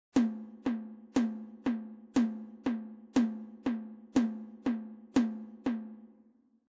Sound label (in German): Takt Zweier